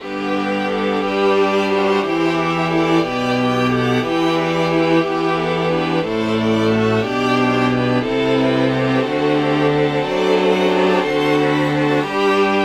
violin

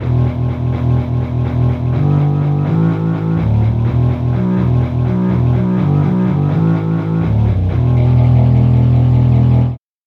viola bass

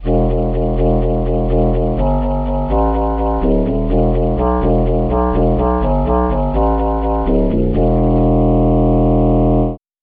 clarinet bass

